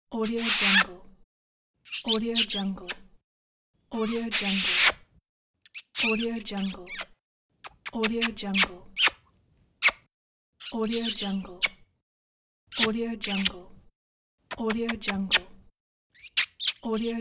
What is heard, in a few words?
kissing